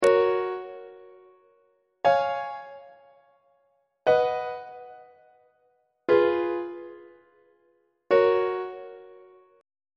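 f sharp major leading tone imperfect authentic cadence on treble clef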